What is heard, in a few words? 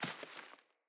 Sandals Walk Vegetation; Player; Footsteps